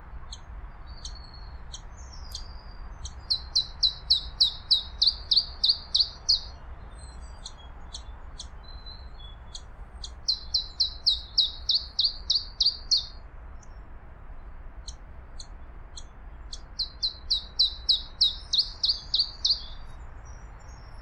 iberischer zilpzalp

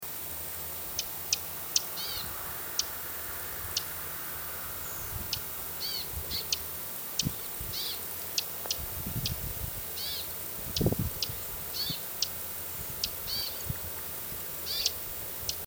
dunkellaubsaenger

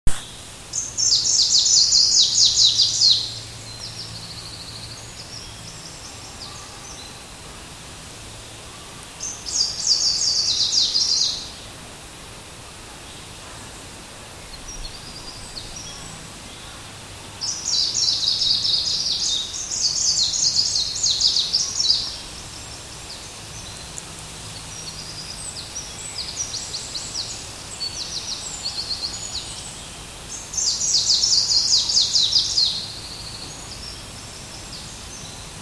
gruenlaubsaenger

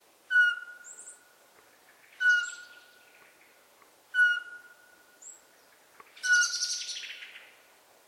sperlingskauz

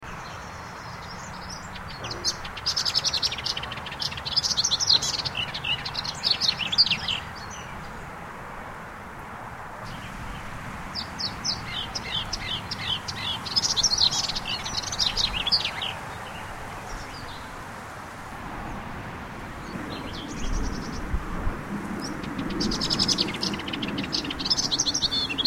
orpheusspoetter, club300

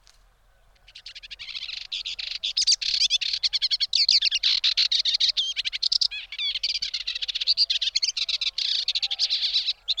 steppenspoetter

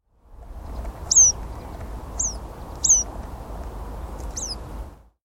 rotkehlpieper

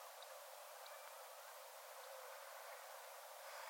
steinortolan

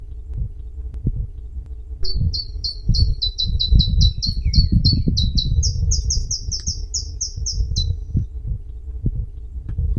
zilpzalp

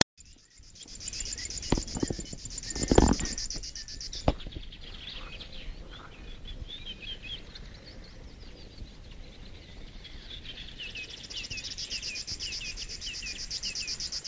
schlagschwirl

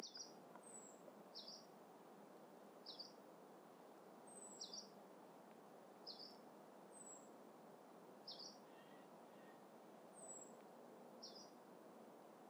tienshanlaubsaenger

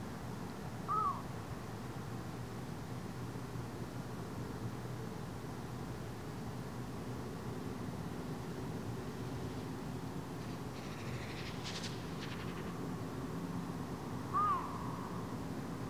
rohrdommel

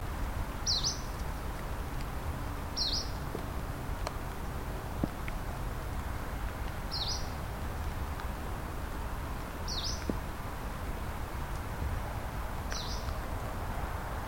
gelbbrauen-laubsaenger

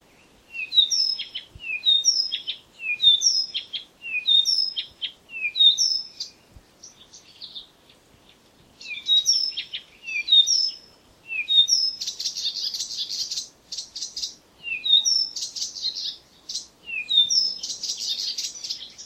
buschrohrsaenger

